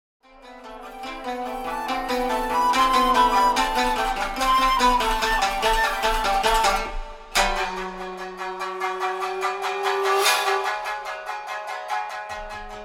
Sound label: shakuhachi, shamisen, 21-string koto; 尺八、三味線、二十絃箏